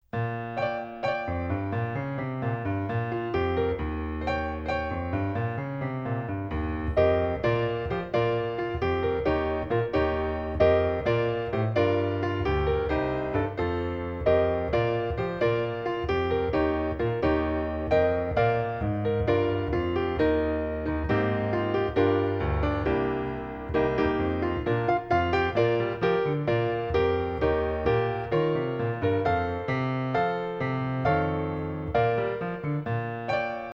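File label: accompaniment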